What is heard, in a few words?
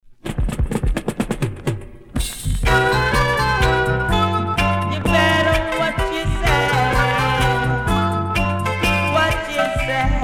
Reggae Music